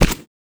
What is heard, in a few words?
Short foley